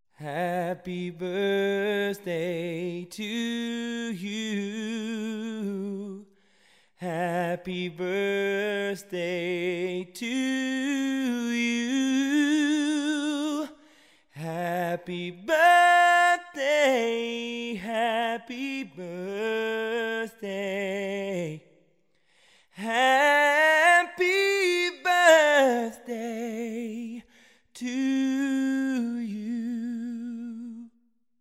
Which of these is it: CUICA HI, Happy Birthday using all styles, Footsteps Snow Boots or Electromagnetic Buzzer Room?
Happy Birthday using all styles